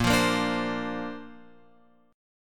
A sharp Major Add 9th, Guitar Open F; strummed

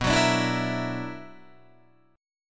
B-Minor Major 11th B down Guitar Drop D

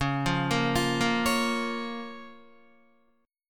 C sharp 6th C sharp Guitar; C#6; arpeggiated; tuning=4E,4A,5D,5G,5C,6F; guitar chord

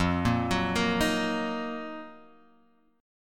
Eb Minor F; Ebm/F arpeggiated; JGuitar

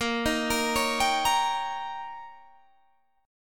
D sharp 7th A sharp, guitar

